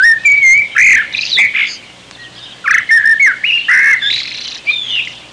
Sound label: Birds singing ambience